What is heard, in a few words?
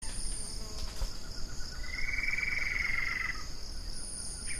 Cherrie's antwren